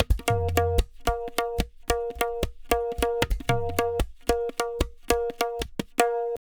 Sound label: Pure Tabla, 150BPM; Zero-G